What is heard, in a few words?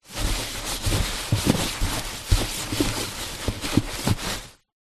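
packaging thin wrap movement inside empty cardboard box; zapsplat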